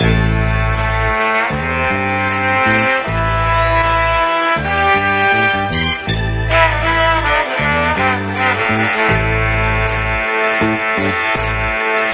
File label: horns, loops, phonky